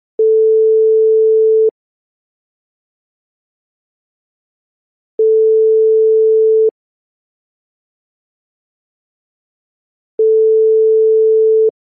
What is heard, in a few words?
ring